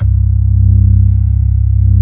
ORG B3 C1; Best Service Gigapack 2

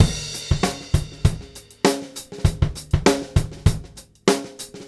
Straight Kit, World Pop Beats

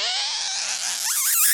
Mouth Fx, Vocal

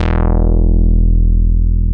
MOOG1 BASS E1

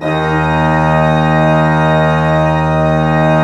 PLENO D2, Cathedral Organ, PEDAL PLENO; Propeller Island

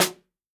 snare drum, jungle snare chromatic

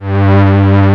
PA, ANA PAD

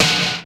SNR; Hollywood Edge; The Big Bad Beatmachine; XR-10

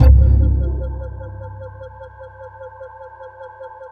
FX SPACE, JV-1080; Syntec; Wall of Sounds

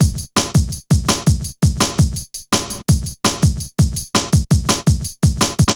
Hard Stepz B, Drum Loops 166.5; Zero-G Jungle Warfare 1, 2 & 3

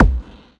One Shot Kick, Drum N Bass - Percussion; Sonic Foundry (Sony Creative Software); Knutrix Drum & Bass

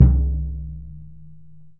Surdo; Surdo Pallet; CENTER; E-MU Producer Series Vol. 2; More Studio Essentials; Composer

